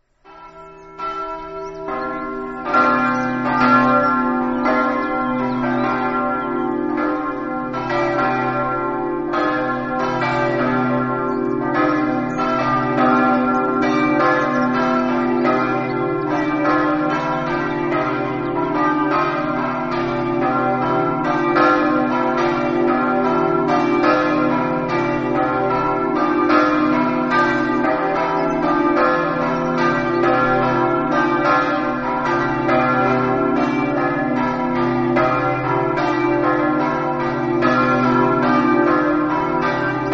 premar, campane

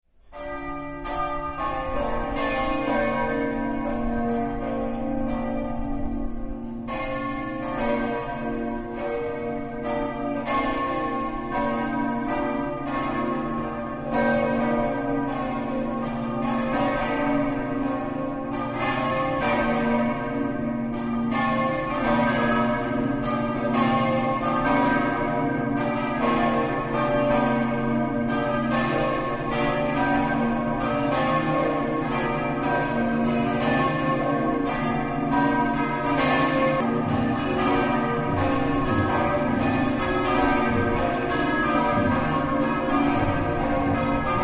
pordenone, campane

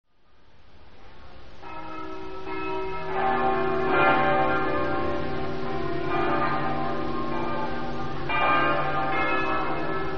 fagagna, campane

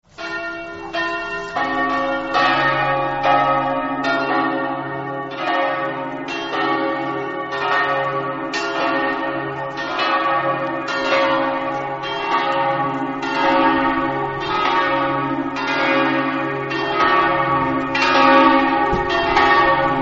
pontebba, campane

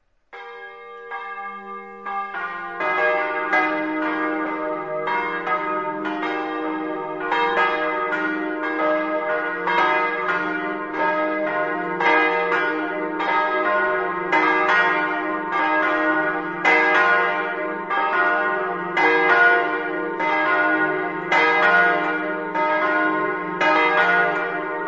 tomba, campane

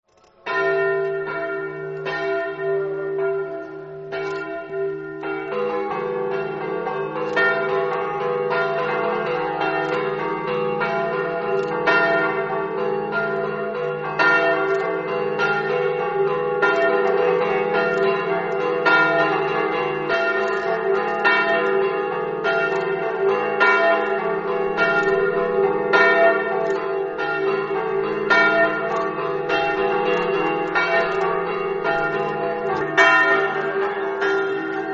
rigolato, campane

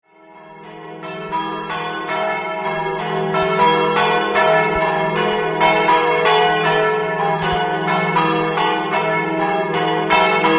coderno, campane

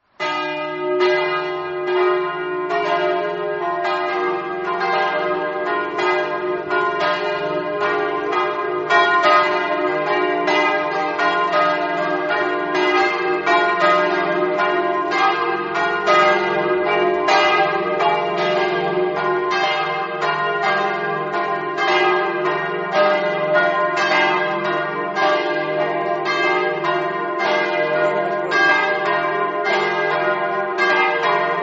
visepente, campane

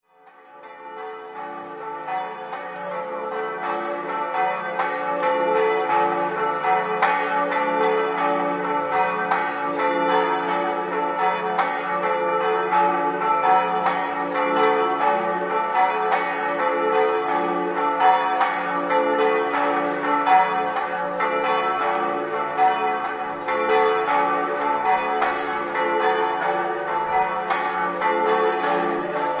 tarvisio, campane

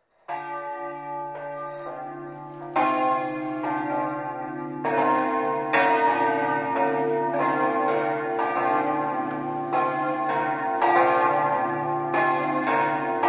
pozzuolo, campane

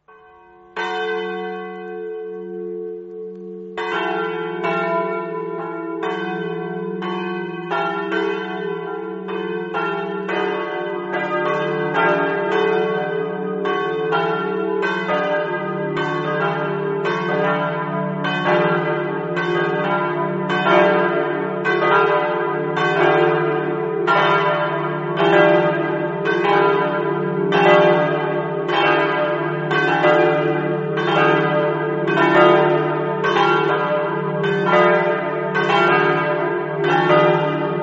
corno, campane

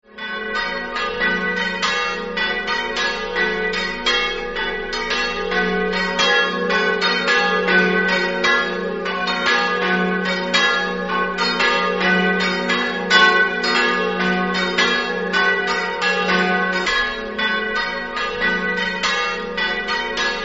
campane; ugnins

